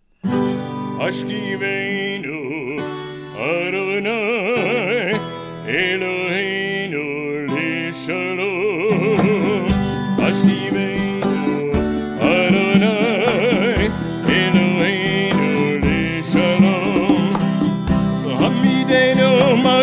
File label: Haskiveinu; Cantors corner music; friday night music